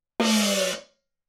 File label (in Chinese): 低音大锣 演奏技巧 鼓音